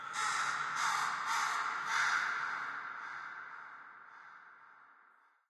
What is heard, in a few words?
amb crow; video games; ambient; nature; source engine; portal2